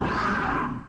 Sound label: cry